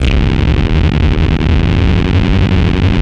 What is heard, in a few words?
SUB WAVE, Special FX Twisted